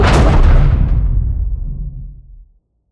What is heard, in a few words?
step; skibidi toilet emperor